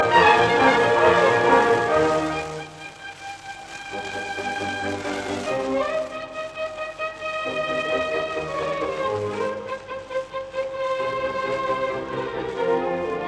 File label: Concerto for 2 Pianos in E flat major Allegro; Wilhelm Furtwangler